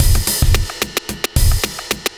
block rock kit

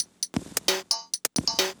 HiHat Perc Loop, Dance Beats

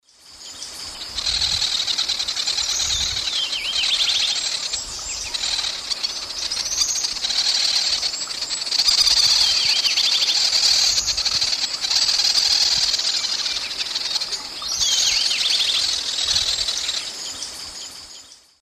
Tachybaptus dominicus, song; S. America; Eastern Lowlands

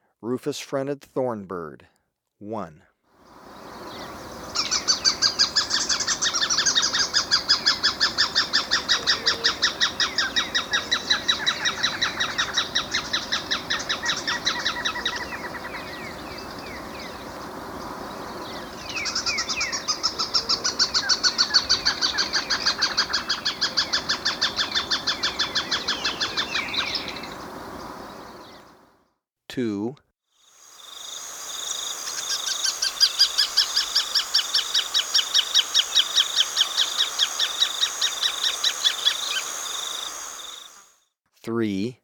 Rufous-Fronted Thornbird; S. America

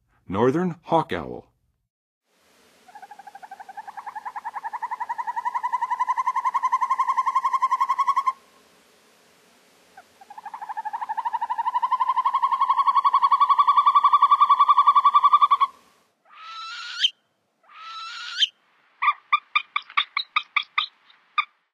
Northern Hawk Owl, Birds of North America; Western USA; Western USA Songbirds

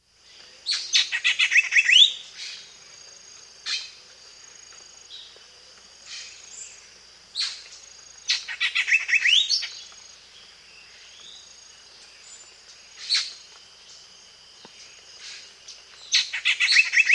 Black-Headed Saltator, Natures Songs; S. America

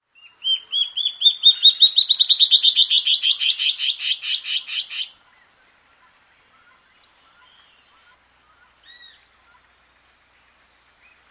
Band-Tailed Antbird, Nature's Songs, S. America

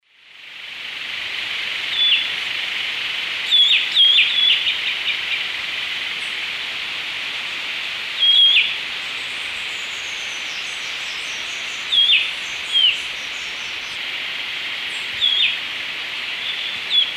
Turdus ignobilis, calls; S. America; Eastern Lowlands

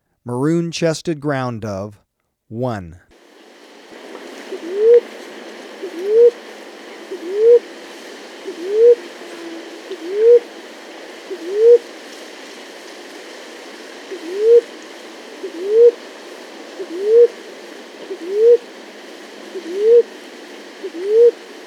Maroon-Chested Ground Dove, Birds of Ecuador; S. America

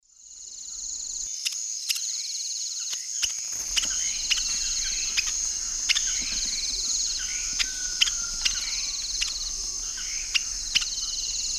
Conopophaga aurita, calls; S. America; Eastern Lowlands

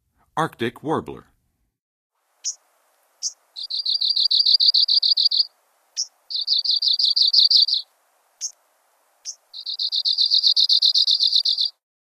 Arctic Warbler, Songs of Birds of North America; Western USA; Western USA Songbirds

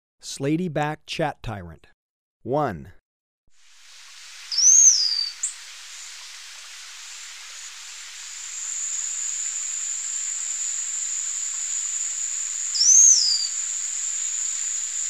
Slaty-Backed Chat-Tyrant, Birds of Ecuador; S. America

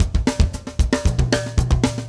metal

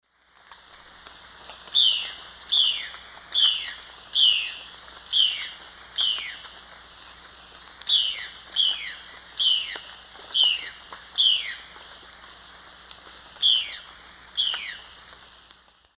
Cyanocorax violaceus, calls; S. America; Eastern Lowlands

